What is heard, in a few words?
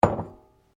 Bowl China Place Bench; Various kitchen bowls; Dishware (Sound Effects:Household)